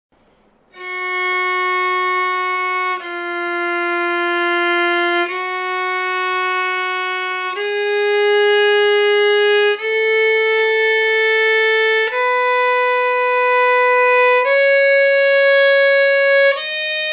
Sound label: scale arpegios fis moll